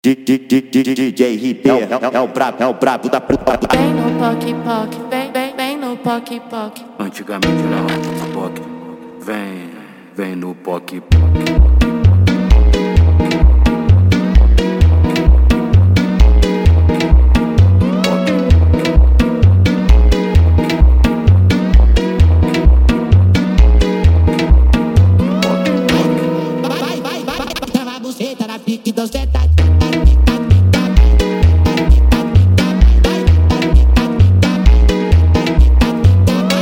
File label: Phonk MONTAGEM